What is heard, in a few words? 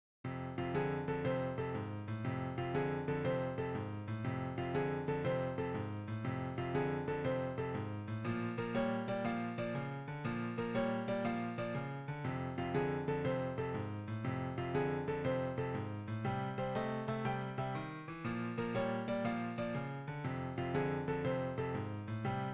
Simple Blues In F; 16Blues